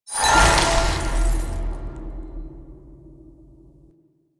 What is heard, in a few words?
Shard Upgrade Removed; Mythic; Warframe; Helminth Shards